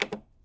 Button - Push, keyboard

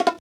Bongo item error; FREE SFX PACK VOL 2

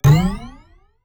Retro Jump Simple B; Bounce Jump

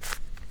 Big Cube - Twist, Rubik's Cube

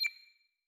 back style 5; inteface sfx pack 1; Back tones

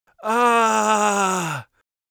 bleeding, Mage Voice Pack

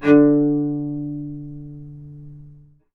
D3 - Sforzando, novice cello

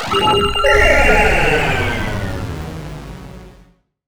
Retro HiTech

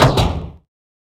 Door Closed; FREE SFX PACK VOL 3

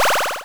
upgrade, Retro Sounds 32-Bit

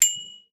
bell

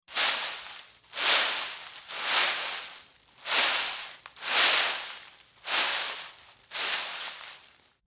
Leaves Footsteps; horror; horror sfx vol 1

